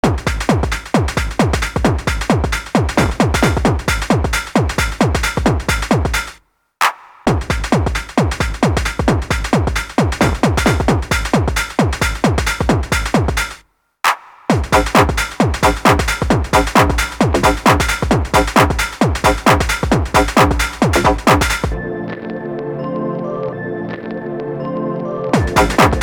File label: BoomBox loop